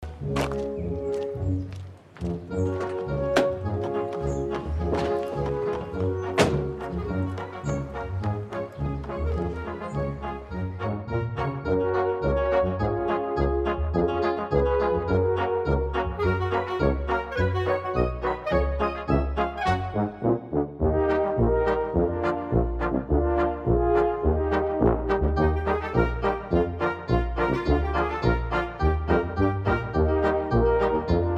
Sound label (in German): Marsch; Hubert und Staller